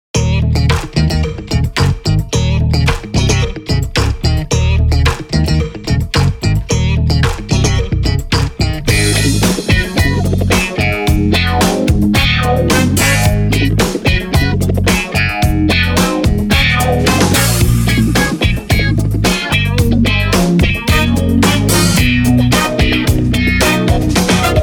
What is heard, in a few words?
Funk dance, ringtone; MIUI12